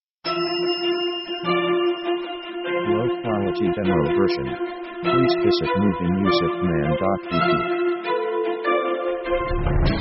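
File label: orchestra exhilarating progress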